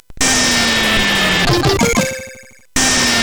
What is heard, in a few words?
Tecnetium, CSG8580R5; hvsc